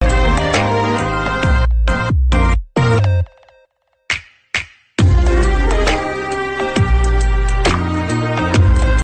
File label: Romantic Instrumental Ringtone; ringtones